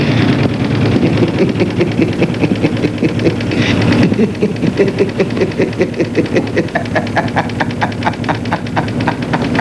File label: laugh